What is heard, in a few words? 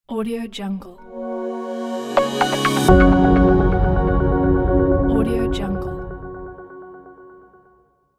business logo